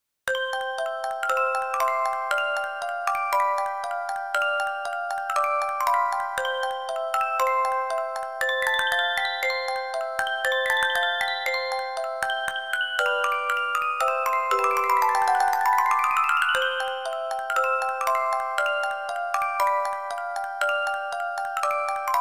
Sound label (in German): rondo D-dur K485; オルゴール チェレスタ ミュージックボックス; ロンド ニ長調K.485